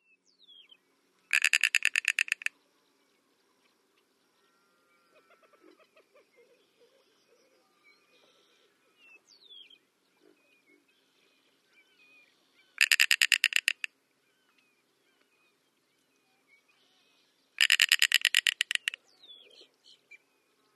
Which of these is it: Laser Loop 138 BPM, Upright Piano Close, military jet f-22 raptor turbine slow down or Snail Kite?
Snail Kite